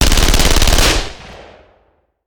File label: Machine Gun; Sound Ideas; Guns